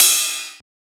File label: Ride; FLPRO